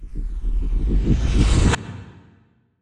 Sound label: Reverse Fx; musicradar